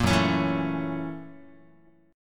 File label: Gb 7th Sharp 9th Gb Guitar; Listen to Gb7#9 strummed